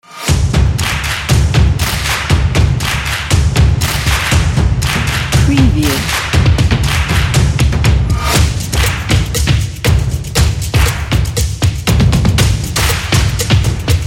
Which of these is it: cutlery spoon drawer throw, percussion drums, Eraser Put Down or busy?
percussion drums